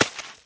snowball damaged; Angry Birds Seasons

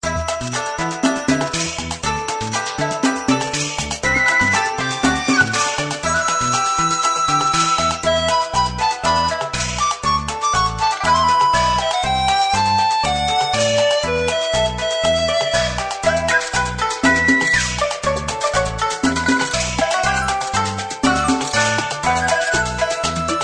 east field music; the saga of conquest; android

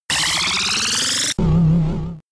Bug Buzz; Pokemon; Gen 5; Blk Wht Blk2 Wht2

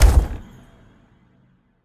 Object Place Wood

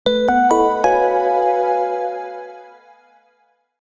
Skip Skimmer (Start Jingle); Wii Play Motion

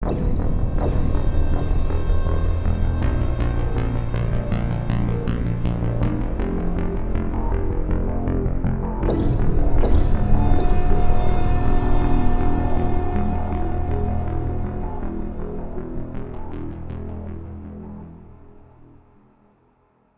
Failure; Mass Effect 3

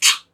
spin

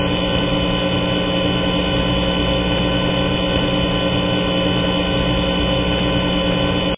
Static Sound; Five Nights at Freddy's Final Hours 2

